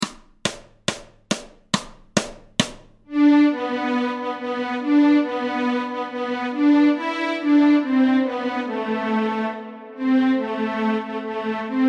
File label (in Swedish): Stråk G, Nyckelharpa; Små grodorna; Notträning